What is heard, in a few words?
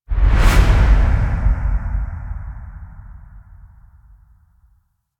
Zoom Whoosh; gameplay; Warframe; NineteenNinetyNine; Stage11FuturePast